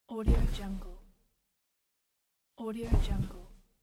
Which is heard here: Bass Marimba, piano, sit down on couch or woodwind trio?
sit down on couch